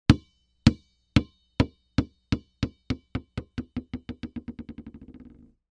Sports Basketball Drop And Bounce Until Stop On Hardwood Floor